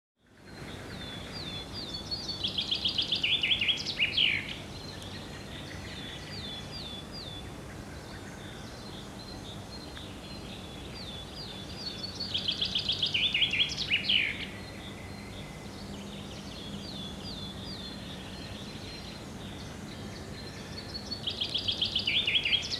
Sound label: Birdsong; Oriental Empires